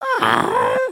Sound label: raincloud; emotes